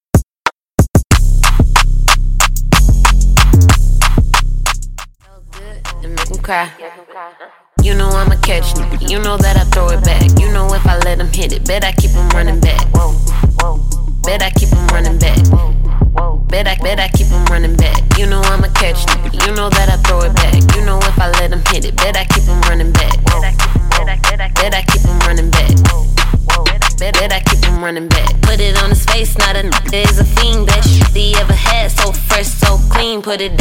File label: Extended Redrum